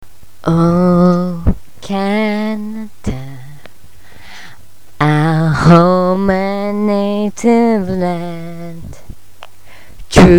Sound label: sings Oh Canada